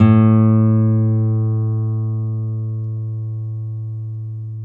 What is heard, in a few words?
NYLON P A1, GUITARS; Synclavier Sampler Library